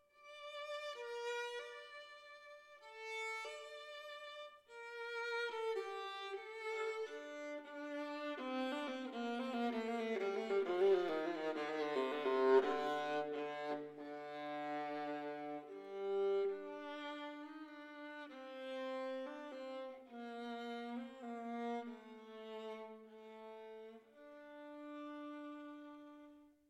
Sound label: Viola